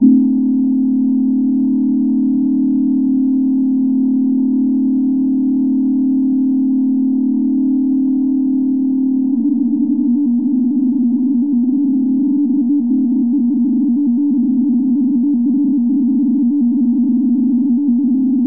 radio drone transmission; Radio FM synth; isr-300

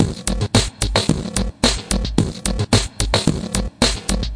110 bright crunchy munchy, drum loops and breaks; 101 to 110 bpm

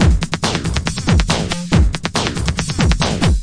140 bpm, drum loops and breaks; 131 to 140 bpm; samples-ghost

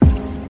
house punch; Punches Hits Discoblasts; single hits

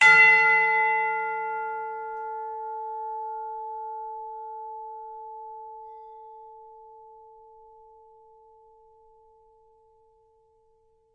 tubular bell long; Sound FX Real Life

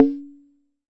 Jomox MBrane, drum machines; Jomox MBrane 11

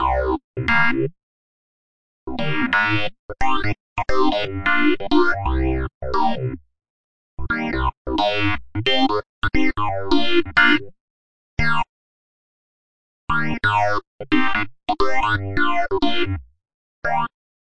088 clavinet funk phase; KEYS ORGAN PIANO LOOPS

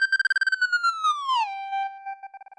bebebebe beep beep bwoop bwoop

